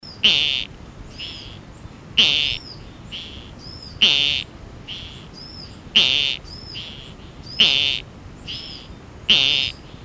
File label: Gephyromantis schilfi